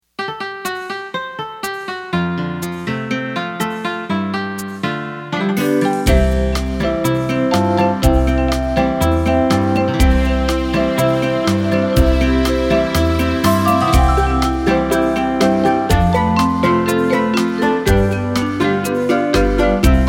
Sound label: INSTRUMENTAL JAMAICA